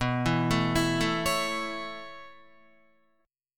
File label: B 7th Suspended 2nd Suspended 4th B down Guitar; B7sus2sus4; arpeggiated; JGuitar